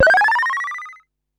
Perc Synth Raw

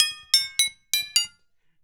PIPDRM, PIPEDRUMS; ILIO - Ethno Techno

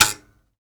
Mbira; Roland LCDP11 Africa VOL-1; M'biras; Bs Mbira 2

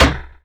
FM PERC

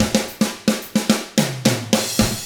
110 SMBA samba; ILIO; Double Platinum Drums 1

